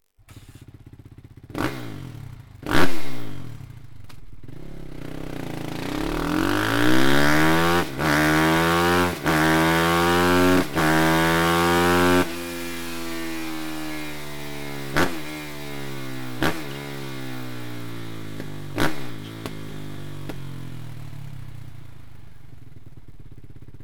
evolution exhaust system kawasaki kx450 f; akrapovic

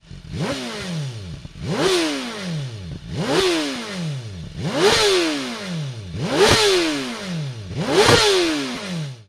hyper cone slip on exhaust bmw s1000 rr s1000 r; remus

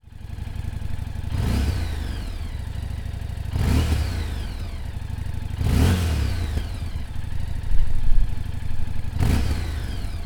Street 500 STOCK Rev, Harley; Exhaust Sound

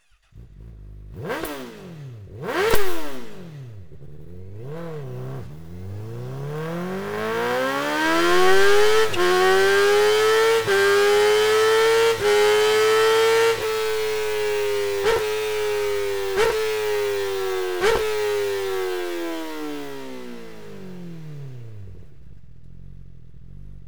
evolution gp exhaust system honda cbr1000 rr sp; akrapovic; sp2

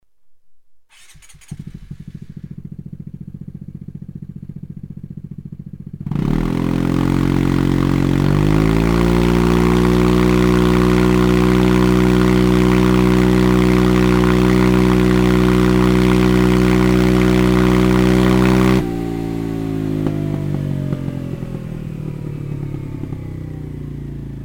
slip on exhaust vespa gts gtv; akrapovic

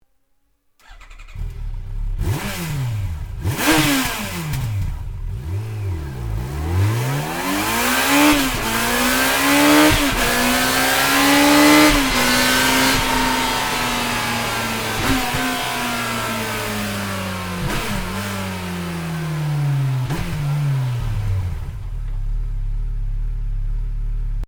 slip on exhaust yamaha fjr1300; akrapovic

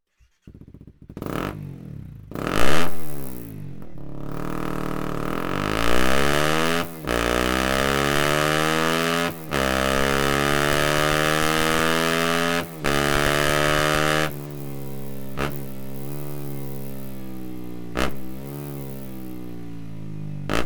akrapovic exhaust header honda cbr250 r

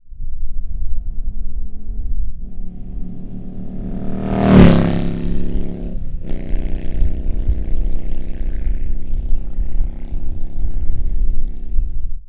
Indian Touring 111 Slip-On Fly By

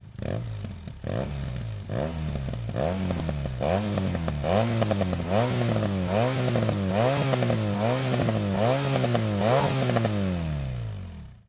remus hexa cone slip on exhaust bmw r1200 r

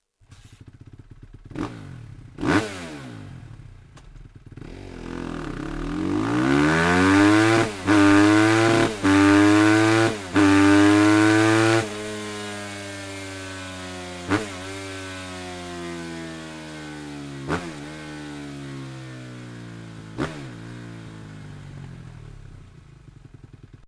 off road racing exhaust system kawasaki kx250 f; akrapovic

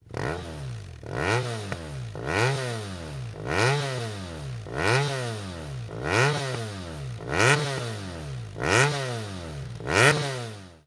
hexa cone slip on exhaust bmw f800 s f800 st; remus

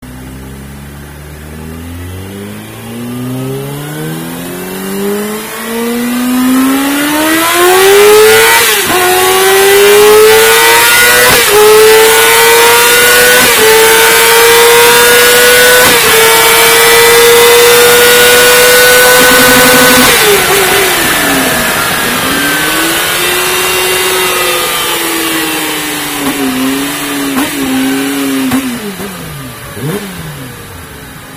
gp pro evoii slip on exhaust yamaha r6; leo vince

